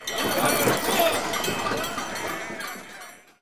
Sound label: Trade Center, completion; 0AD